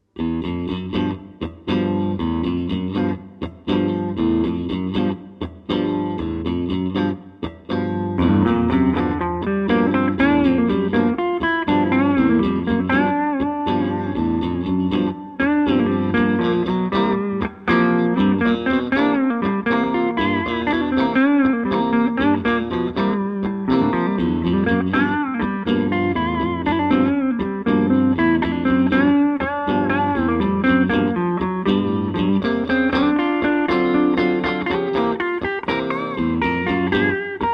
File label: blues; Výuka hry na kytaru